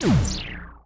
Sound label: Pickup SciFi; Opsive; UltimateCharacterController